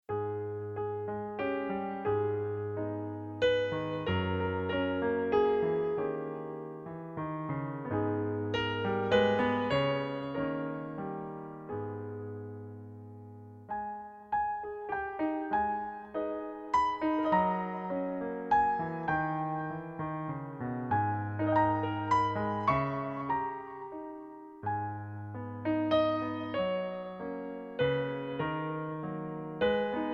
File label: Piano Version; The Coventry Carol